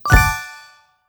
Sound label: Firework Hit; android; ellens garden restoration